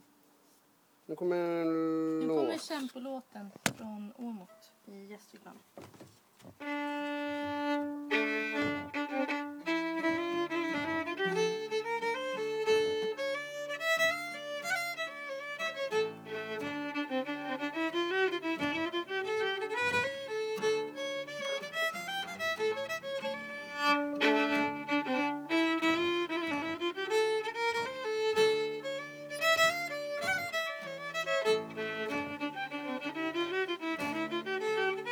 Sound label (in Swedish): Kämpbolaten åmot gästrikland